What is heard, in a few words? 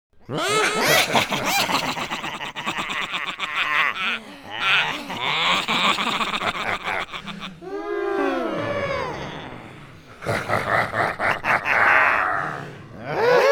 laugh split personality